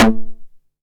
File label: 909 SD Tape Sat